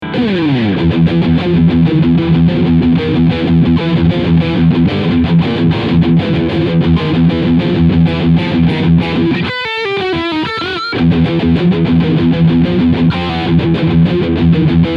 Tone 9 EQ Flat

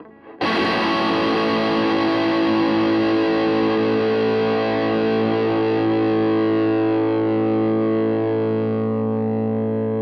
Maybach Sustain; Harley Benton Vergleich

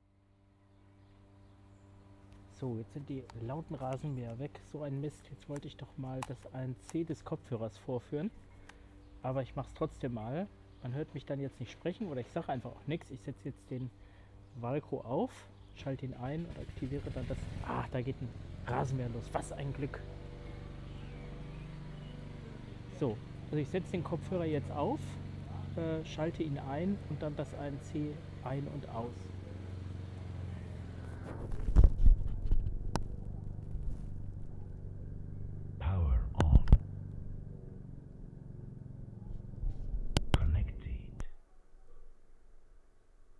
Valco VMK20 ANC Test

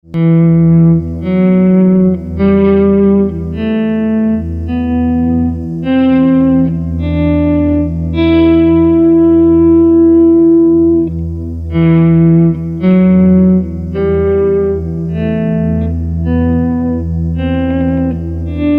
Em Scale

